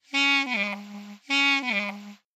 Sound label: clarinet